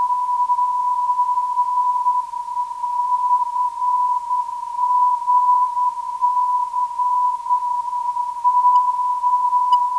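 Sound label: non-directional beacon; CGC; 354kHz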